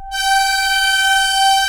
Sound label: RM19 FILTER G4, BASS; Q Up Arts; Dream Experience